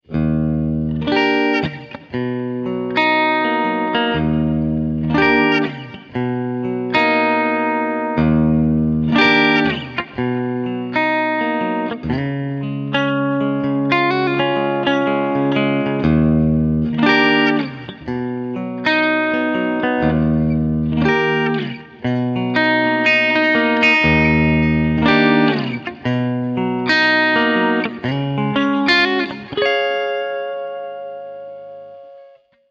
Clean, guitar

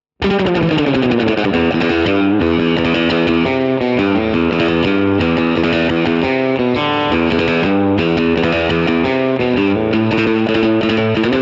Bridge 7.5k Surf, vintage guitars

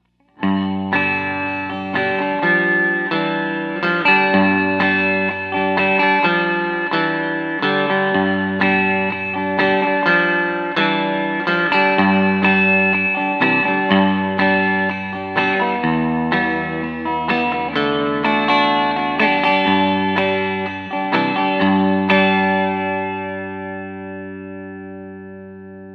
Both pickups, guitars

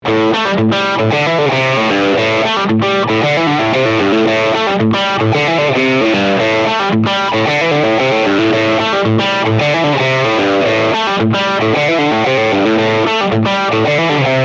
Bridge 7.5k Drive, guitar

